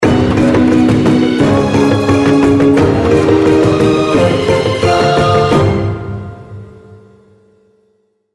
mega Win; tiki-beats; eyecon

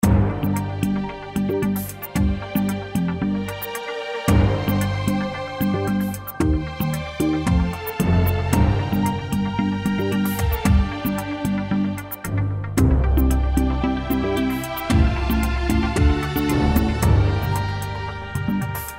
base game music; Hot Off The Press

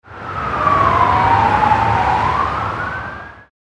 policecar background; Beat the Bobbies 2

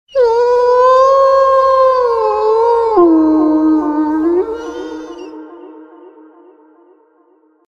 wolf Howl; Shamans Dream 2

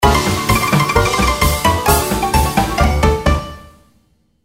you Won; Dynamite Digger Eureka

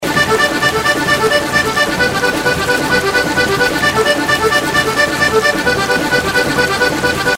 anticipation loop; Lucky's Fish and Chips

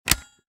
target pop back up; Fluffy Arcade: Doubleup Ducks LuckyTap; Eyecon

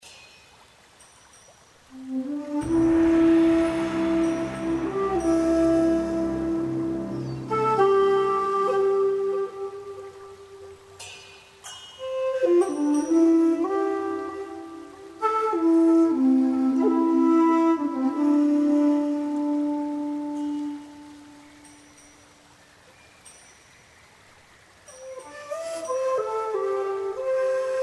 bg music ambience; Wild Koi; Eyecon

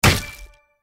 landing fish basket; Lucky's Fish and Chips

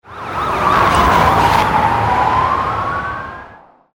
police car no win; Beat the Bobbies 2

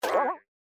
Spin Press spring; Bouncy Balls 2

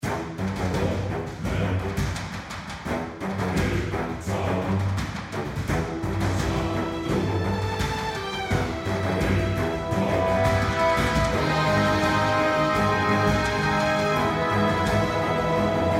general Music layer; first Symbol; Champions of Valhalla

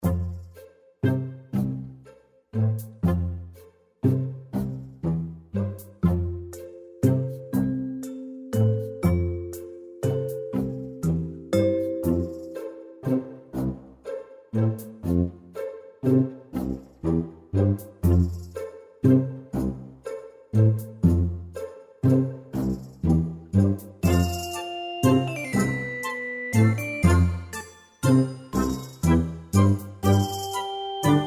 background, base game music; Fluffy Favourites Megaways

